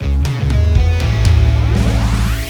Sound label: Waiting; Timberman VS; ps4